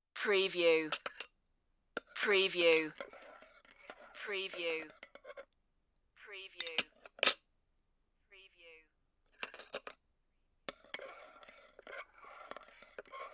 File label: cup and saucer